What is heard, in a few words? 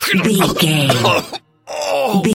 voice male death